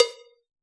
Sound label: hip hop kit cowbell; Dr 202 Kit 1; Percussion